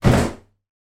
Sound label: damp towel drop into bathtub distant roomy perspective